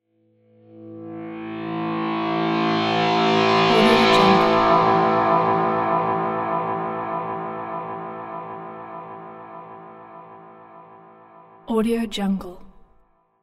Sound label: threatening distortion transition with long reverb